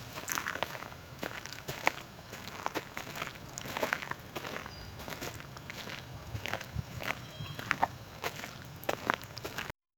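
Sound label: walking through the jungle, tropical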